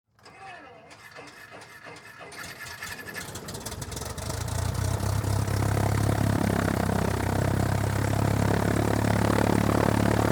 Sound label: Airplane Cessna Start Idle Taxi, A Cessna propeller ai; A Cessna propeller airplane starts engine, idles for a while, then taxi on runw